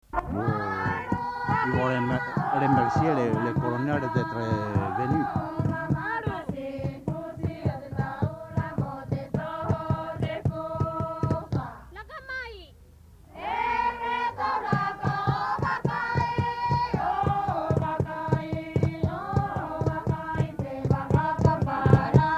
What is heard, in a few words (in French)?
mua choeur